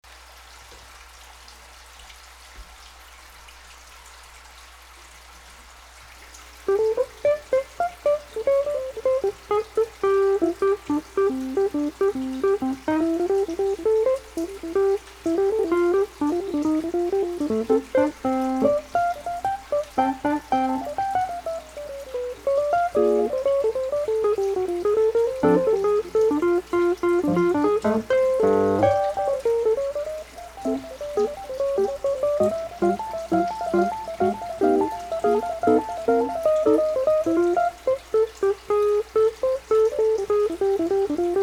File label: soft rumble